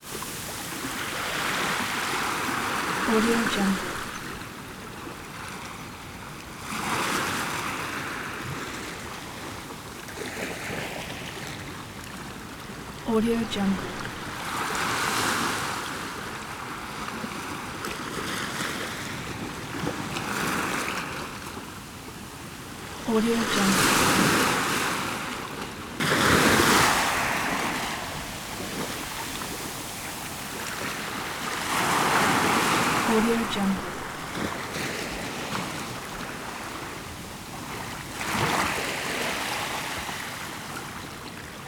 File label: water lap in bay with foam constant